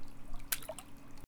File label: Smooth Water drop